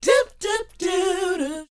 SHOO B G#C, R&B & Dance, Vocal; ILIO; Vocal Planet VOL-4; SHOO BE 80

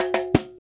Rio Batucada

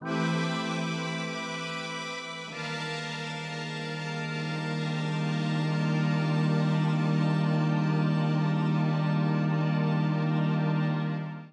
PAD, Hip Hop and R&B; Drumloops; Best Service; ProSamples vol.01; AKAI

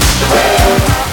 HIT 1 LOOP; Big Beat Samples & More; AKAI; ALL LOOP KIT

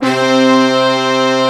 full BG brass; USB Soundscan vol.49 - Analog Fantasies; AKAI; YAMAHA

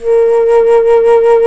FLT, Bass Flute, BsFlt vib; Roland LCDP04 Orchestral Winds; 90 s Sample CDs

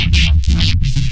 neuro bass compress

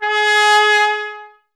TP G#; Miroslav Vitous; Solo Instruments VOL-1

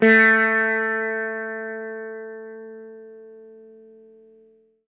EL SITAR HRD; Quantum Leap - Guitar & Bass

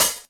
Open Hhat, HiHat; Equipped Music; Loungin House

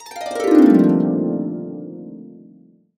BB MAJ, Harps; USB Soundscan vol.62; AKAI

